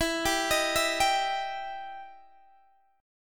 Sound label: E Minor Major 7th E down Guitar DADGAD